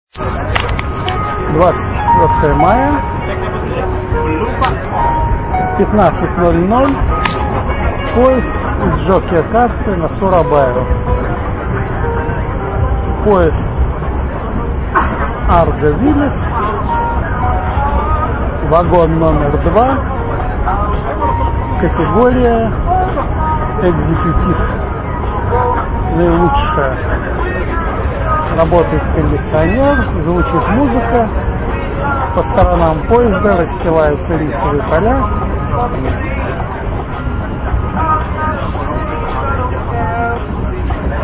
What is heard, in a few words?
indonesia sounds; geopoesia